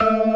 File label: ORGAN, C3 PERC; Keith Emerson Signature Series